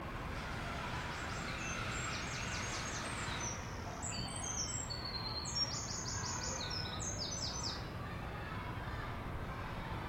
beautiful birdsong and children playing recorded in my garden